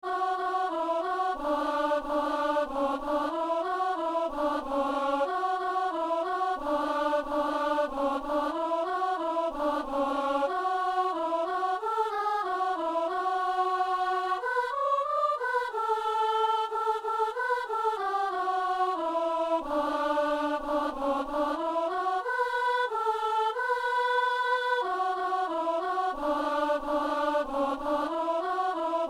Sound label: soprano; Messe du 17 janvier à Saint-Denis